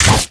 decayed basic attack; LoL; yorick

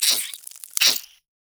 voidling death; LoL; alzahar

